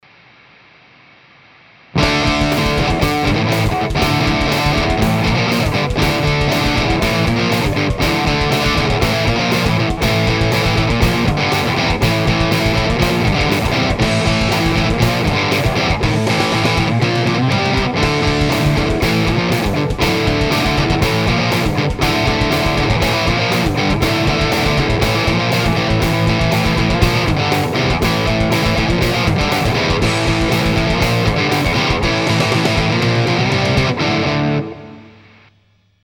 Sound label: Riff